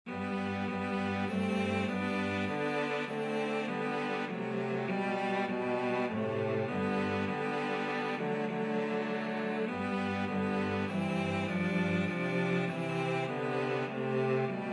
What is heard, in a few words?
joyful joyful, cello trio